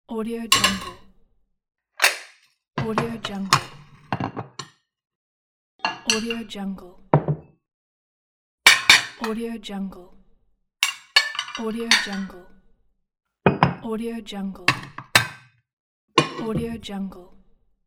putting down plates on table

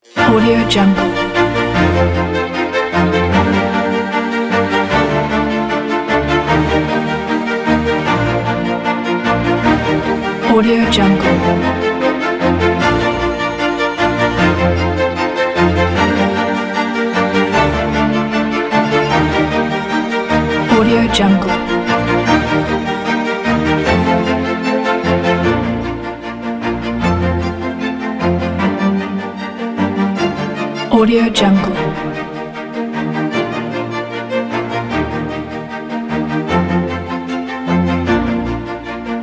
positive classic

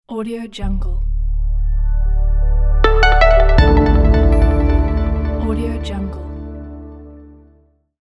cool logo

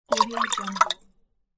water

